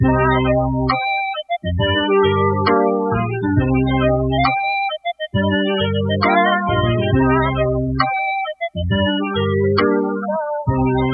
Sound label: Various Melody Loop - 135 BPM C Min; Cymatics; 2020 Melody Collection